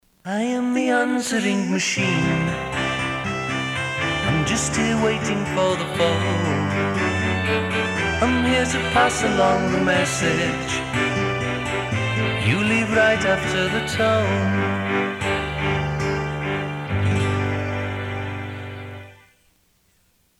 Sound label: Telephone Tones British Invasion; ATT Telephone Tunes